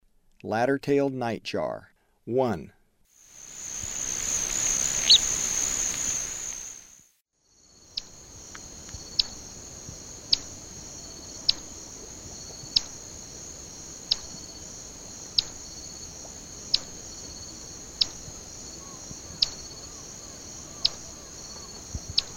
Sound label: Ladder-tailed Nightjar; S. America; Eastern Lowlands